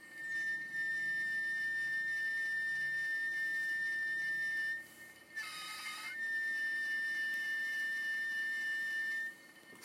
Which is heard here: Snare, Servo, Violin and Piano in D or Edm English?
Servo